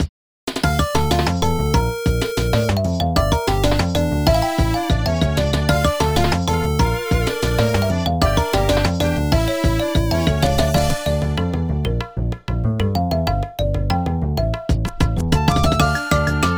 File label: Forest Boss; Drawn to Life; Nintendo DS